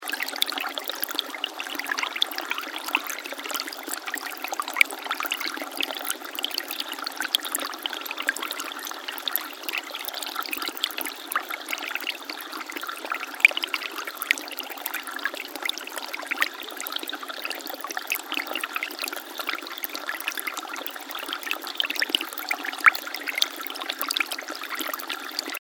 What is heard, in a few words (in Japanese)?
排水路の流水音